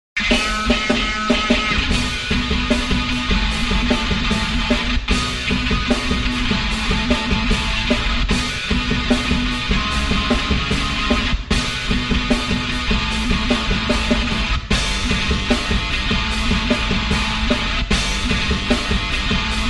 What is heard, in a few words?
drums heavy